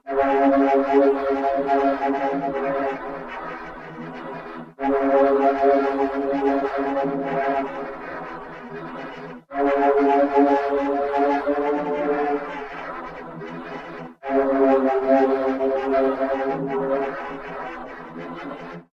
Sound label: Flute Groove